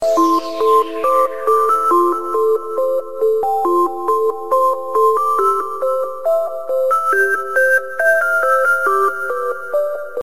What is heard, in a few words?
i phone message ringtone